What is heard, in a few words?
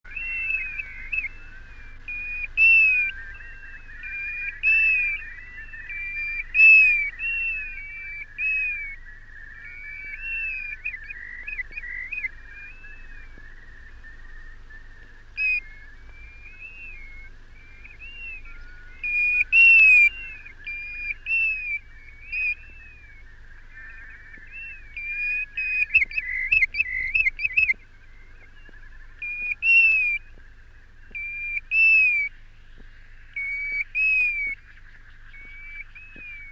apricaria; ISL